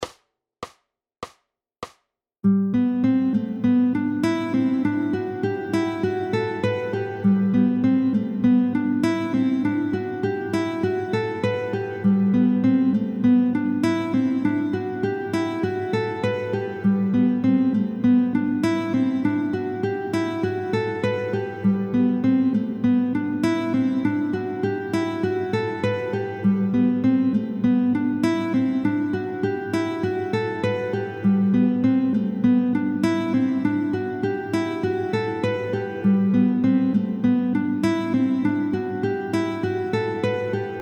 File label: Guitar Gym Sequencing oefening